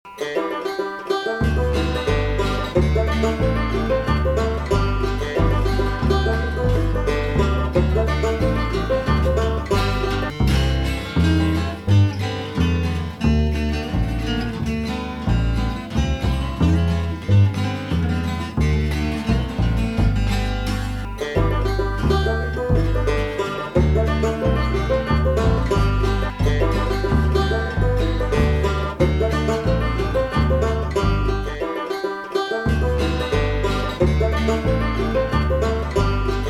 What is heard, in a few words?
Banjo